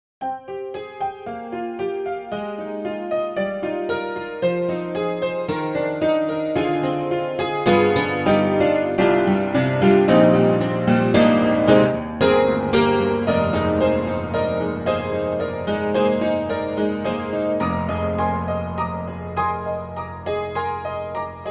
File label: Upright Pianos Modern Upright Bright Chorus Modern Upright; Synthogy; Ivory II